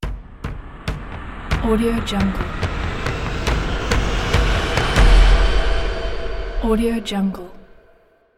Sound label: jet trailer hit